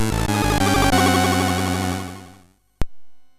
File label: sid MOS6581R3; Heavenbound